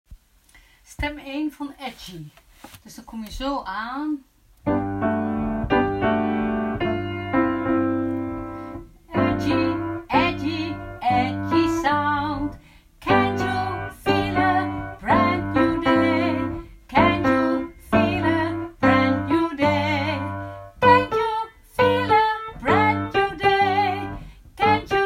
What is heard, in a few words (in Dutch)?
6 kleuren inzinger 1 ste stem eind